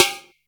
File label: Snare, Boss DR-660, Drum Machines; 90 s Sample CDs; 300 Drum Machines